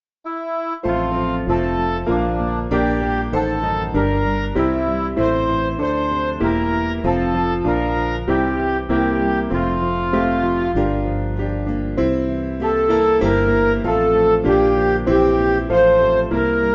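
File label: Music Backing Files for Churches; Come Gracious Spirit; Hawkhurst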